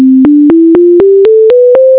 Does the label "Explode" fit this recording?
No